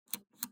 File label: button